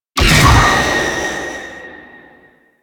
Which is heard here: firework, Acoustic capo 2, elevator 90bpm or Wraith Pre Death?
Wraith Pre Death